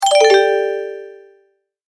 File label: Multimedia Tone-Return/Back